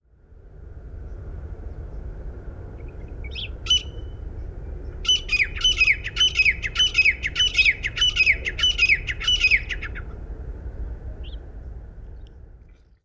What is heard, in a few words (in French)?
Francolin gris male, Oman; Quyriat